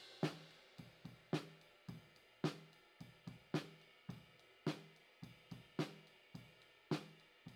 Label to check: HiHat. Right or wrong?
Right